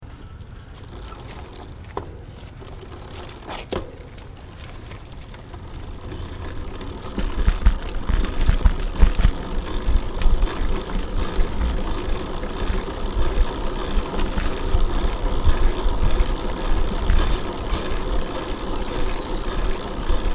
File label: bicycle giant crx3 riding railway crossing bad gear